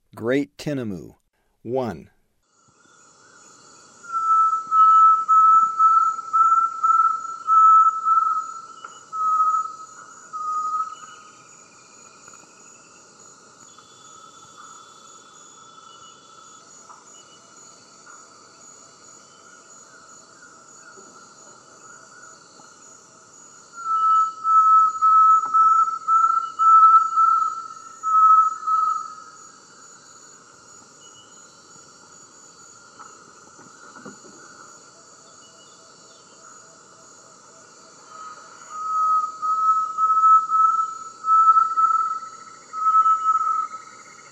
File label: Great Tinamou; S. America; Eastern Lowlands